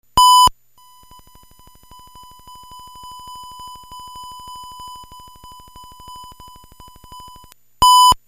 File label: dmc, nes apu mixer recordings; sabicom